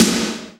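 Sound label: Snare Gated Reverb, E-MU Proteus-2000; 300 Drum Machines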